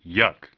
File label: yuck